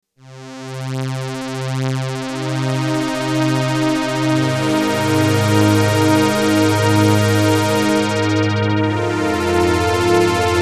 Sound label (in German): Marion Systems MSR2 LFO auf Filter